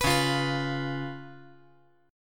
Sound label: C sharp 7th C sharp